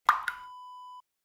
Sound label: water droplet